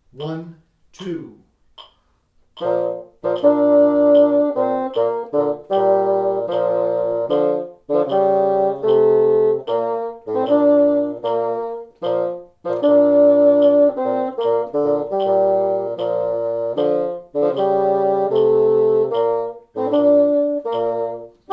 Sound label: Polish Bassoon 1 and 3 76; Parts 1 and 3; "Polish Folk Song"; Rubank Elementary